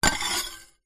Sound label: metal scraper scrape ceramic surface short; foley